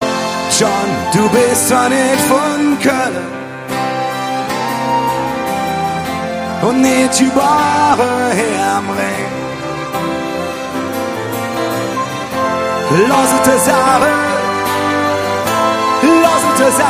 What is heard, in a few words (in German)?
live mit orchester